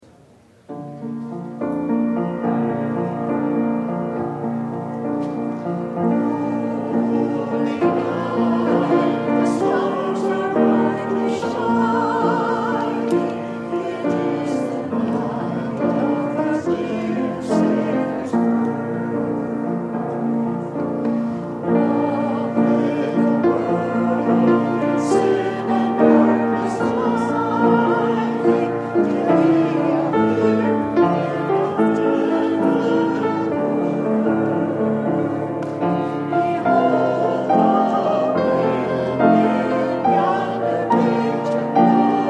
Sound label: Special Choir Music; First Trinity Lutheran Church; Christmas